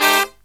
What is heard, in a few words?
low chord, brass riffs; USB Soundscan vol.29; AKAI